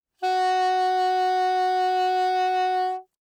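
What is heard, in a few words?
Soprano Saxophone vibrato ff Gb4; Woodwinds; Bb Soprano Saxophone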